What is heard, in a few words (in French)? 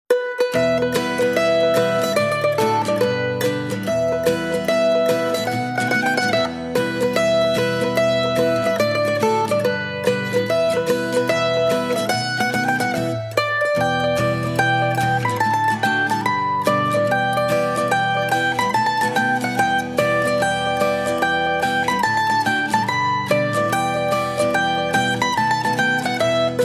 MANDOLINE TARENTELLE